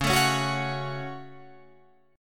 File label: Db Suspended 4th Sharp 5th Guitar Drop D